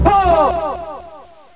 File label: pull